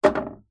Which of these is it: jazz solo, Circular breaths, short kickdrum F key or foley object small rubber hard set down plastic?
foley object small rubber hard set down plastic